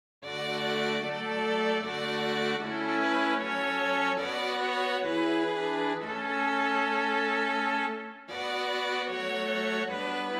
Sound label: A Mighty Fortress Is Our God INSTRUMENTAL